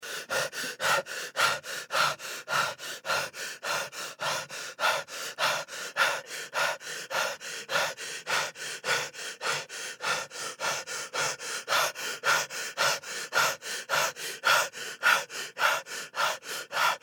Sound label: Human Male Pant; Breathing